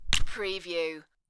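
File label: Cat Scratch Mic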